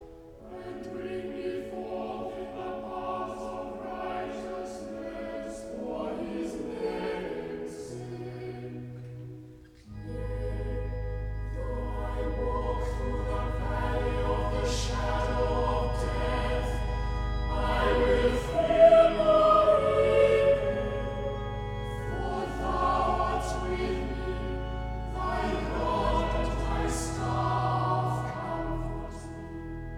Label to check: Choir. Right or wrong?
Right